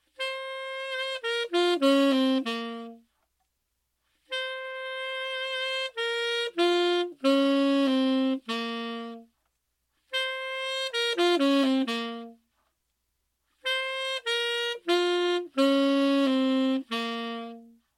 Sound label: sax